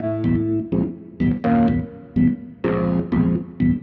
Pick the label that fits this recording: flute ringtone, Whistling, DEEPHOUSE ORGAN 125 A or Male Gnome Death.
DEEPHOUSE ORGAN 125 A